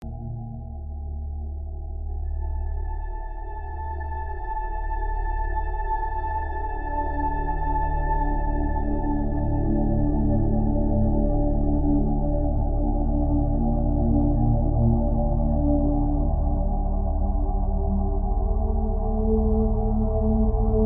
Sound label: Factory Tension (Nearby Ambience); Five Nights at Wario's Deluxe